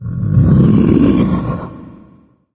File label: mancubus chatter; doom3